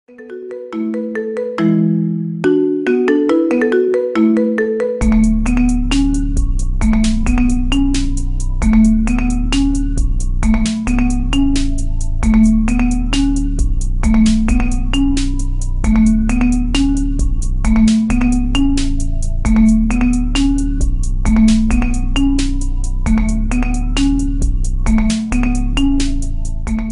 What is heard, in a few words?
marimba remix ringtone